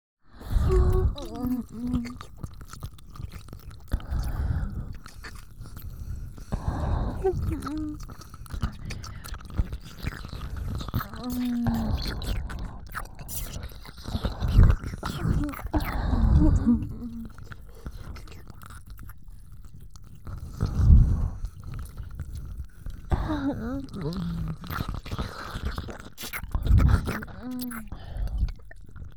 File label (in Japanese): キス＆耳舐め, ASMR